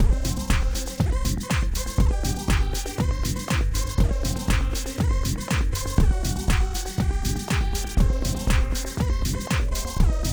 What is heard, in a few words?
Music Alien; Dreamball